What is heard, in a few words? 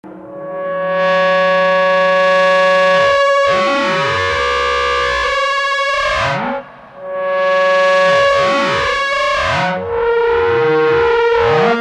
VCLFO, Photocell; arpaxxe